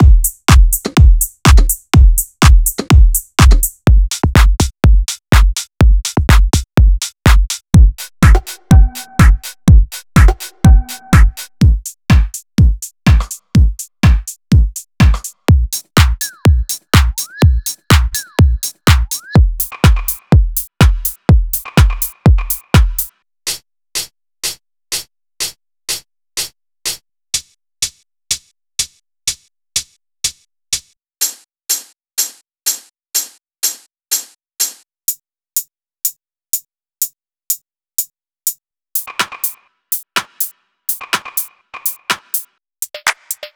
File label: house deep grooves